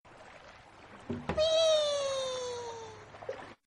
notification sound

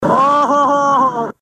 backflop

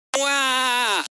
waaaaa

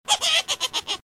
witch laugh

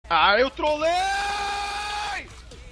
ah

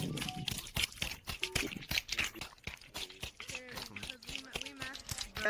juicy squishy sounds

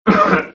door slam; mj

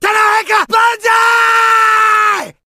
japanese banzai shout; cod waw

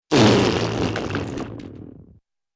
flappy raspberry

